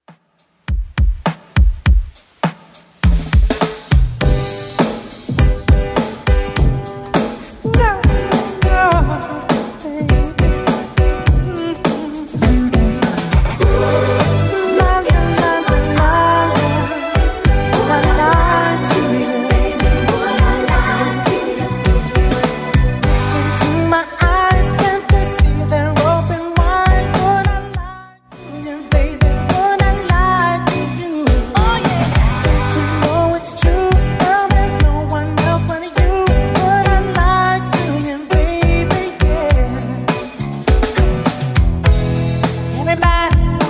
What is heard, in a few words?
90s Redrum 102bpm